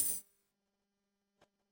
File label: metallic ui click